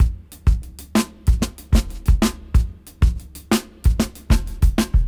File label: Ragga 93 BPM; AMG - Keith LeBlanc Phat Butt Jams